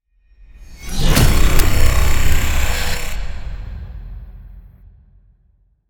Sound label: hit swell